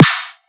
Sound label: ringy snare